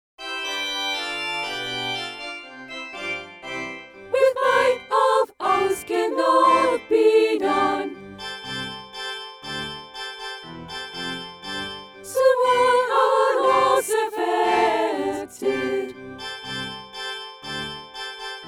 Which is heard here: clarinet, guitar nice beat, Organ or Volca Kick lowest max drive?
Organ